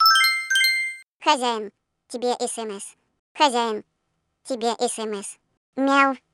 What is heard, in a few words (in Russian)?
tebe sms kot